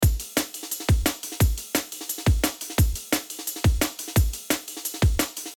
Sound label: Drums all together